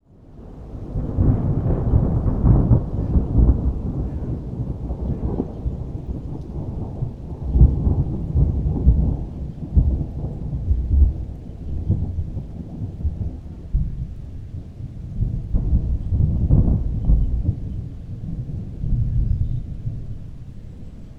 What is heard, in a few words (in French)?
Orage